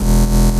Bass

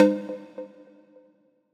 pluck

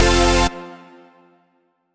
Stab F

